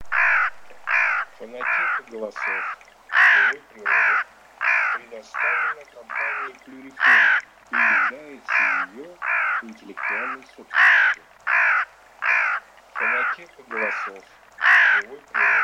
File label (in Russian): Серая ворона Cornacchia